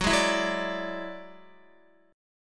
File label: Gb 7th Suspended 2nd Sharp 5th Gb down Guitar; strummed